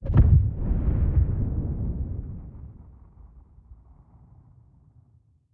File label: ambient; World of Tanks